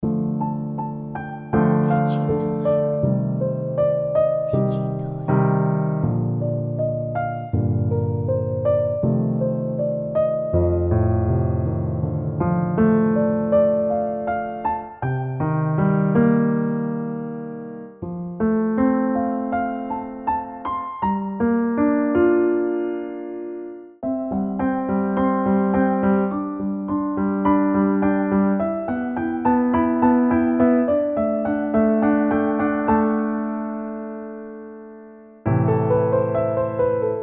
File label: Cinematic Pop Piano; Diginoiz